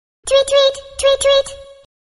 am thanh thong bao vui nhon; messenger

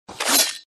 tieng tan cong bang mong vuot sac ben

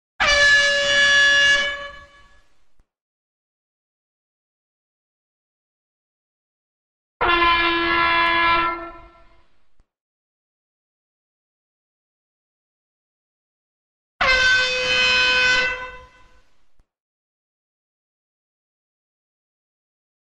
Tong hop cac tieng thoi coi hoi the thao

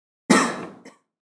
ho mot tieng

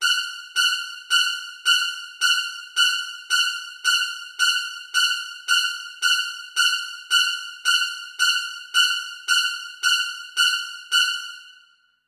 Nhac nen cang thang khi linh cam thay chuyen xau

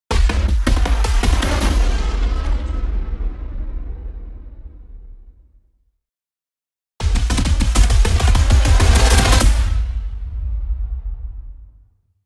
nhac nen ket thuc cao trao phim su thi lich su

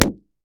Tiếng bong bóng nổ

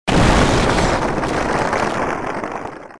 Tieng no lon

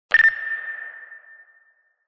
hieu ung am thanh radio beep

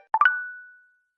Nhạc chuông tin nhắn tinder

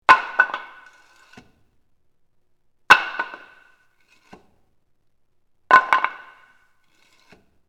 Tieng nem cay gay go xuong dat

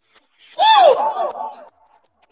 tieng het hu vang vong dj

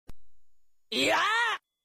het nhat ban

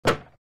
tiếng cạch khi va chạm

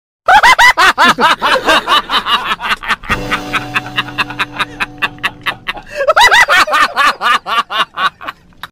tieng cuoi hahaha

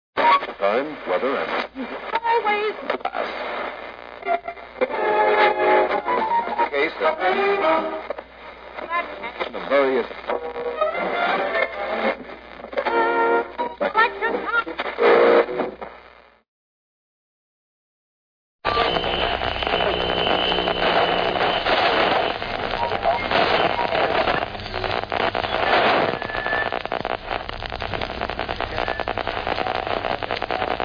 Am thanh dai fm vui nhon trong hoat hinh

